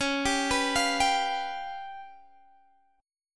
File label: Db 7th Flat 5th, Guitar, Standard; Db7b5 arpeggiated; JGuitar